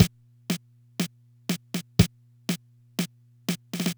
Loop, dd-5

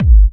Bassdrum Clean

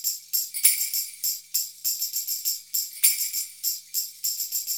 Modern Percussion Loops; Soundscan vol.56; AKAI

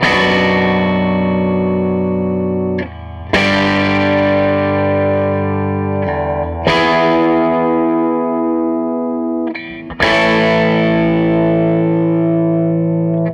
SG Guitar Loop

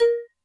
Xylimba, Alesis D4, Drum Machines

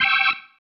Stab, Organ, One Shots

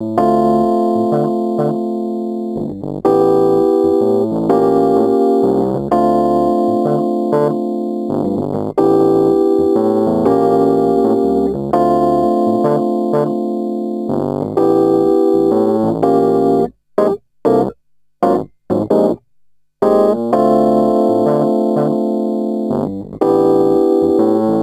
Rhodes Attacks; Ueberschall

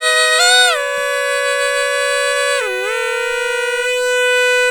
PUNGI

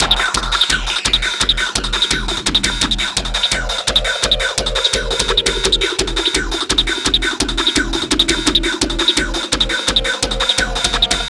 loops 170 bpm; MEGA DRIVE; Masterbits - Tekkno Trax

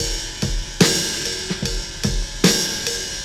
Backing Rhythm; Cinematic Funk Urban Tone Poems; Sonic Foundry (Sony Creative Software)

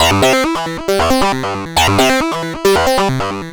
Synth

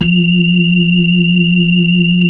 B-3, hollow fast

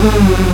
synth, f#, Rave Synths; Best Service ProSamples vol.37 - Dance Synths

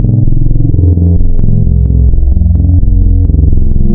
Spectral, wavetables; Architecture 32

